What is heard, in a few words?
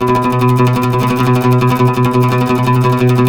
TREMOLO, Turkish Oud